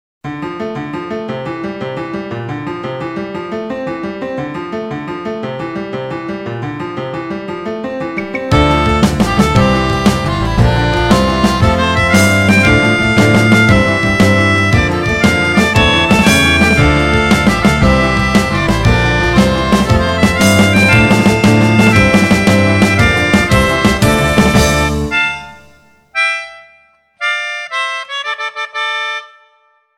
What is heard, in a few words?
pianica